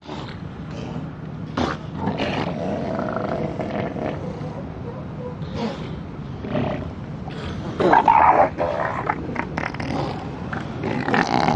Seal, Elephant Growl, Snort. Multiple Growls, Snorts With Ocean In Background. Close Perspective.